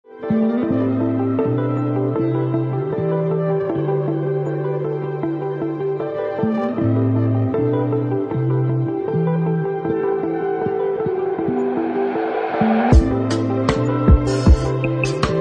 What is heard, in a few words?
Relaxing atmosphere